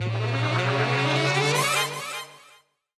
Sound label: respin start; riviera Star; Fantasma